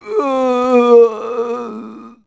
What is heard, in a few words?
Growl; cstrike; zombie plague